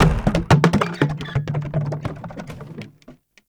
Large Plastic Garbage Bin; Warframe; Ambience; Nineteen Ninety Nine

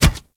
Kubrow Run Metal, Footsteps; Enemies; Warframe

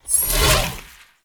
Launch Shroom Proj Whiz By; Warframe; Nokko; Launch Mushroom

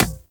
Beach Ball Kick; Beach Ball Floof; Water Fight

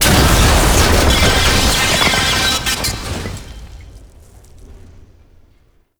Academy Door Destroy; Tauron; Warframe; Betrayer

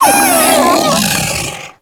Feral Vocal Death; Warframe; Kubrow

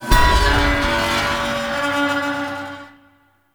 Metal Scrapes And Hits, Asteroid; Warframe; Grineer

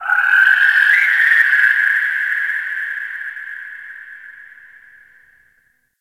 Ocean Underwater Creatures Low Medium; Warframe; Grineer Ocean

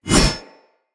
Curved Sword Whoosh Heavy Right Left; Weapons; Player; Swords; Sinecure

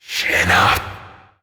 Possessed Red Veil Vocal Attack; Warframe; PriestQuest

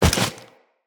Jump; Characters; Generic Foley

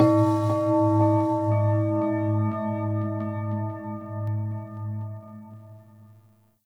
Temple Low Bells, Corpus Ship ambience; Temple One

